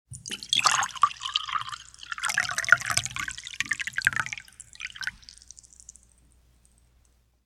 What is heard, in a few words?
food drink tea pouring full cup; sound effects one